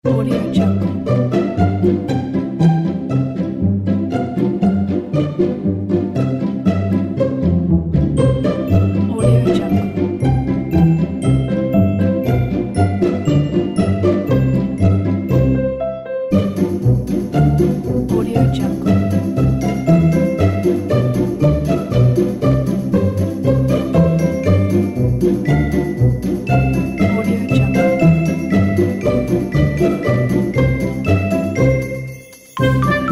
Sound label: positive and optimistic